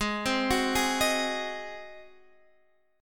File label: G sharp 7th G sharp, Guitar Standard; G#7; arpeggiated; JGuitar